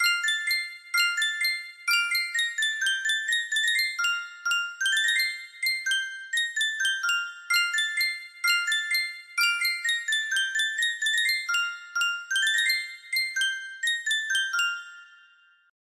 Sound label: Sankyo 12 note music box